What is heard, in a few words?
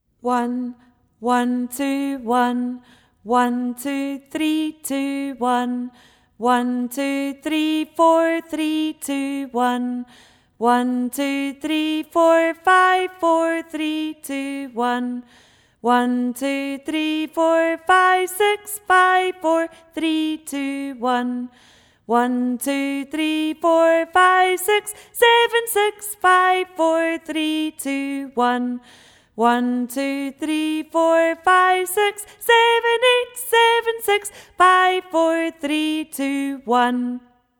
Major Scale 1 8